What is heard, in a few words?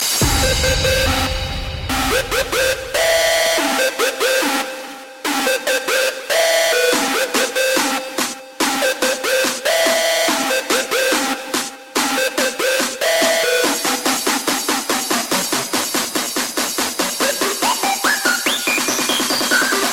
Sound Syth; Techtonik